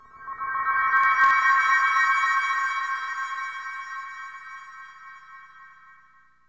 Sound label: PLANET, SYNTH FX; AMG; Global Trance Mission VOL-2